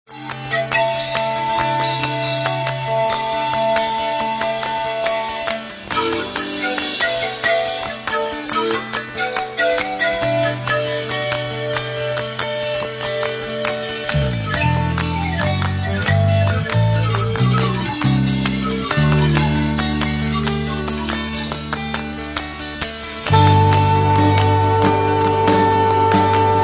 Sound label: blues